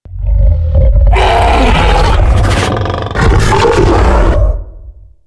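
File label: krayt dragon dead; Star Wars Knights Of The Old Republic I; XBOX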